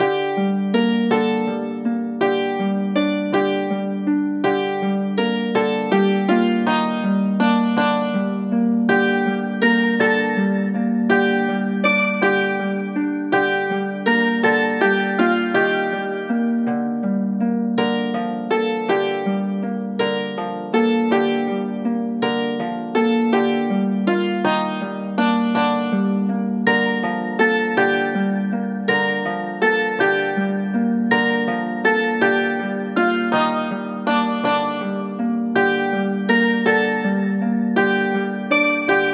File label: pray